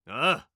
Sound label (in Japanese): あぁ！